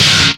FIRE SNR, Drums; Distored Reality 1&2

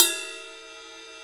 Ride Cymbal

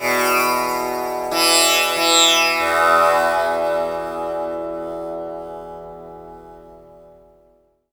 TAMPURA; Spectrasonics; Heart of Asia

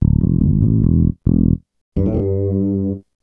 Smooth Bass; Sonic Foundry (Sony Creative Software); Knutrix Drum & Bass

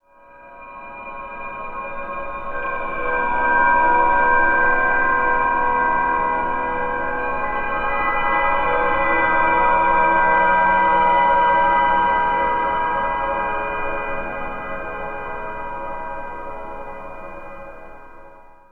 Slow Bell Drone

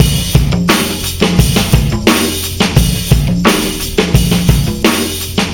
Monsterfoot w hat, Drums Beats

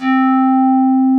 BASSVOX; Masterbits; Vintage Voltage; SQ+FX

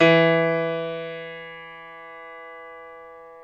PNO Grand Pno; Club-50 - Foundations Roland

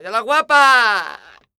flamenco vocals, male

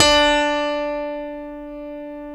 StereoGrand, Keyboards

